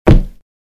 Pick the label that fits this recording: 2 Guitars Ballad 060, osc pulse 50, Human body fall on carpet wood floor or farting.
Human body fall on carpet wood floor